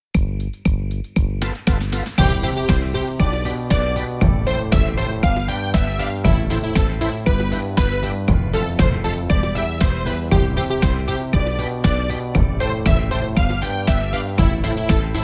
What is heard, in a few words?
cheerful folk